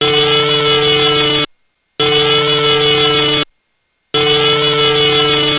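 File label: Emergency Alert System Alt Tone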